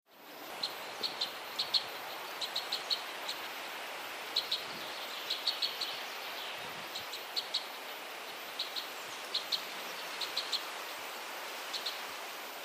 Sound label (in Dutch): Witband Kruisbek contactroep